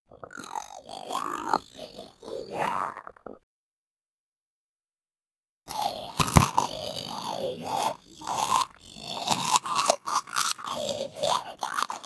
a zombie bites the air

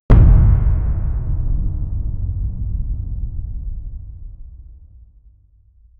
cinematic 6 second openin, abrupt

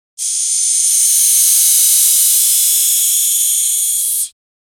snake hiss sound; reptile

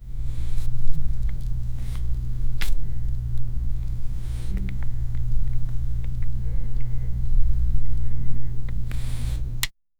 a small fluffy character, movement

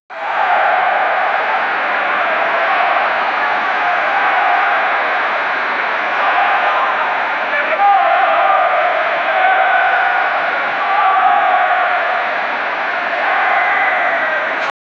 football supporter, outdoor

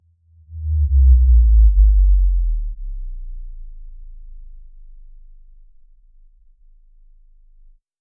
a premium power off sound

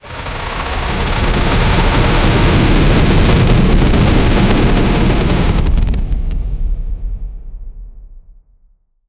falcon attack

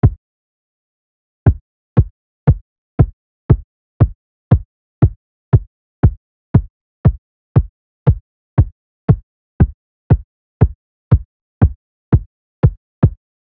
stylish soccer kick

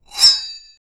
the sound of a long, sheath

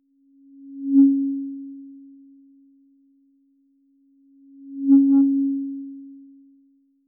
warning shot starts; alert

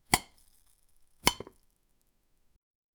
chopping herbs knife on bo

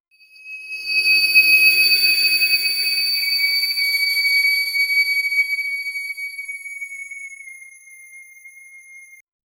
ethereal magical fairy

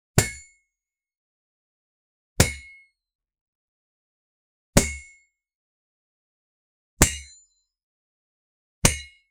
sharp instantaneous blade, thwack

